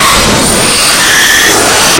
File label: jumpscare; insanity